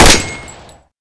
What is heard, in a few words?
shot, MP5